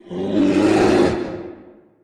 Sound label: bear; Animals